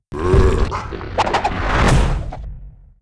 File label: CRAWLER CAST die